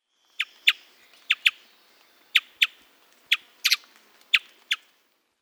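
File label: sounds of thrush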